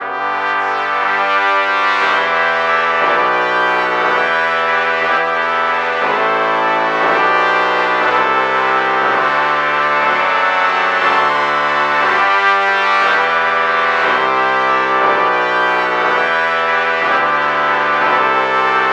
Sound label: trumpet trumpet lpc false bach morph